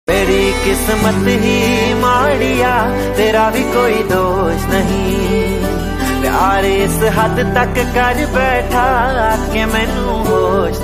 song ringtone

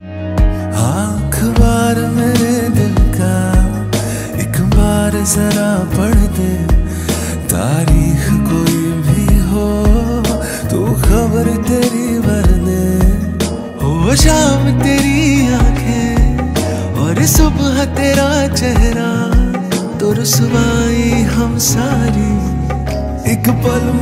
song ringtone

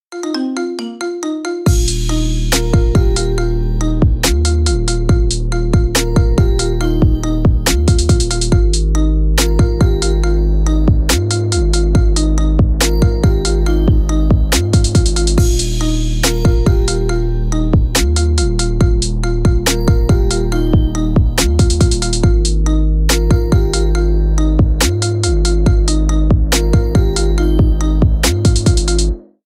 marimba remix

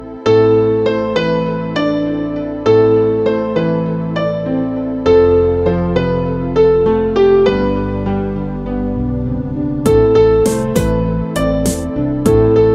instrumental ringtone; kabir singh

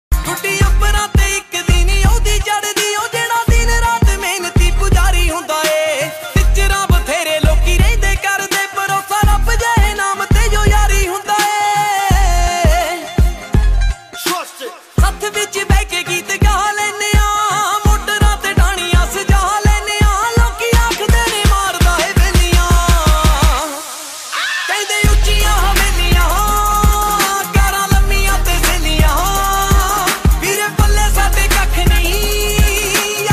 Punjabi Song

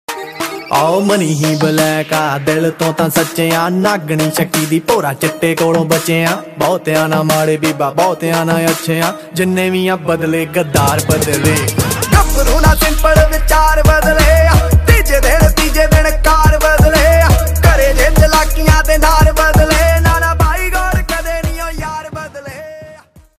Punjabi

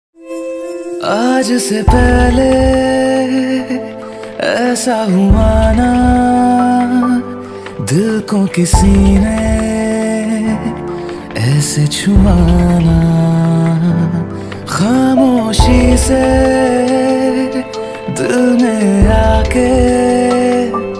ringtone

